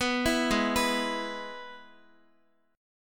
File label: Ab Minor Sharp 5th Ab down Guitar; Abm#5; arpeggiated